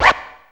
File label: slippery; BEAT2000